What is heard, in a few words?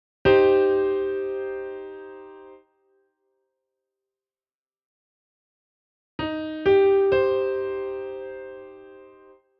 akkord